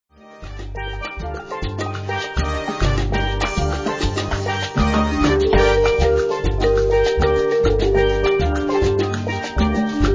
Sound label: latin marimba